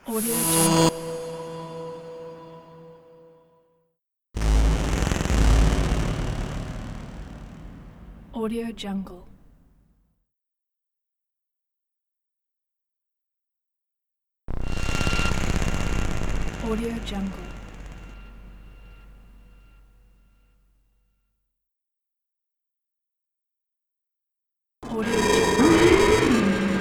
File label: dark scifi transition pack